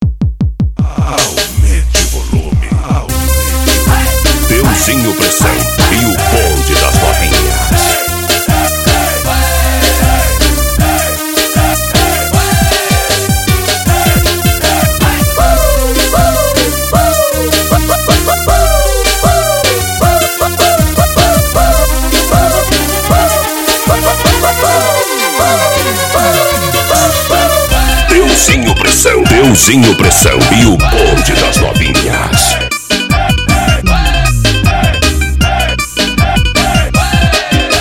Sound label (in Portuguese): passagem de som